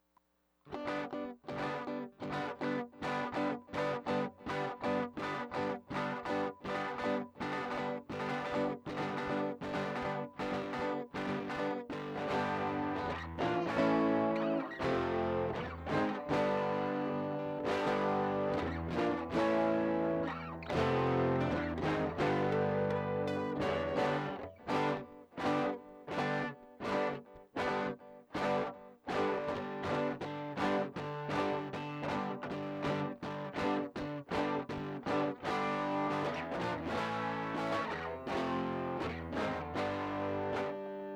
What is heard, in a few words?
riff roll; riffs